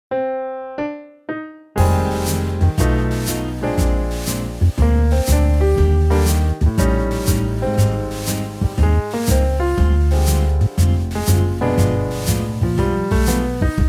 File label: Jazz